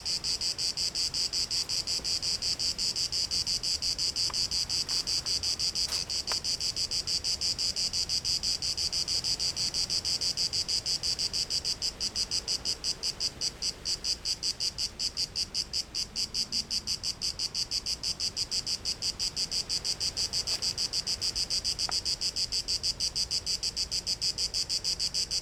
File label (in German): Zikaden; Greece; Fieldrecordings; Parasporos Abfahrt 2